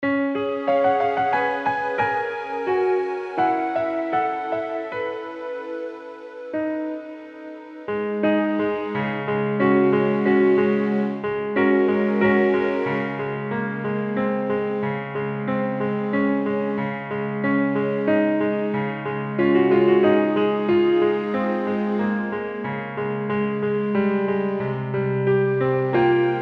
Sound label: Communion Hymn Acc Med